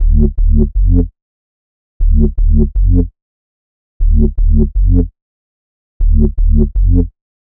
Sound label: Loop Bass 120 D, Deep House; Peace; Ghosthack; Advent Calendar 2019